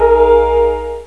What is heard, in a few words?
Butterfly Appears Sound; Koji the Frog 2.0.1